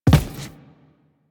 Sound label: Footstep Default; Warframe; Railjack Crew; Steel Meridian